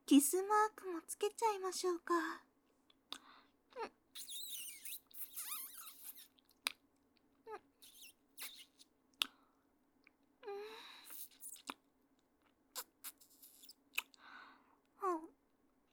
耳舐め系; リリスチャン

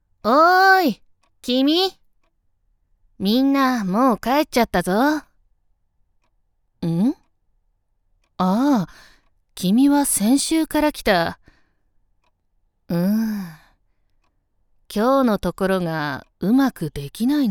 左 ぺろぺろ耳舐め; 手こきを添えて

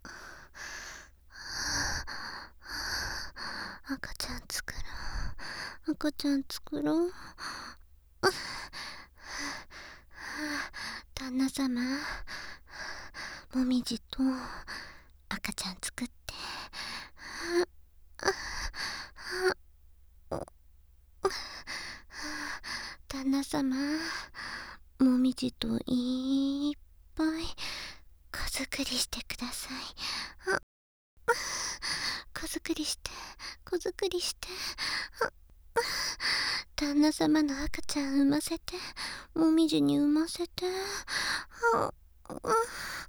喘ぎ; 赤ちゃん作ろ♪

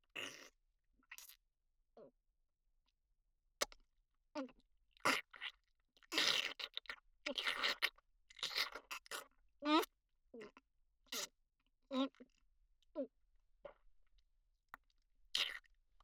フェラ・精液を飲んでいる声; お色気お姉さん系カノジョ

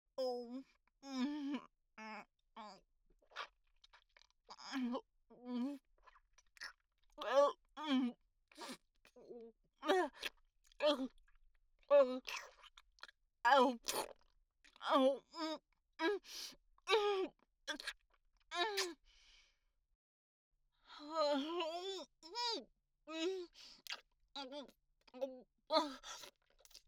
イラマチオ・恐怖に泣きながら口に咥えている声; お色気お姉さん系カノジョ

